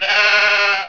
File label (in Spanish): oveja, animales